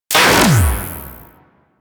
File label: Charge Gun Shot A; Crp Charge Gun